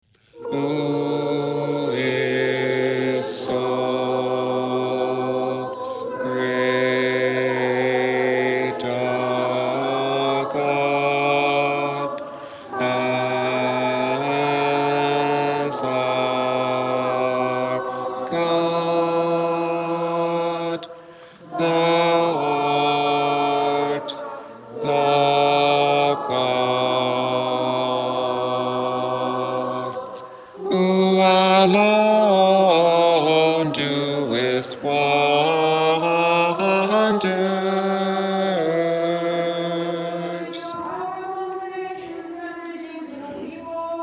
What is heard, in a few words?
who is so great choir with bass emphasis